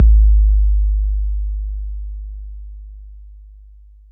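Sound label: bass drum 808 Smooth B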